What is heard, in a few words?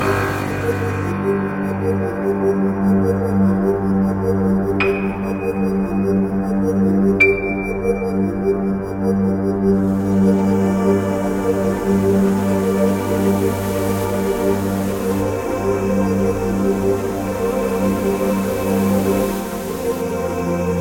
DarkCave Loop; fumiko linux macos windows xbox one gamerip 2017; FutureWorld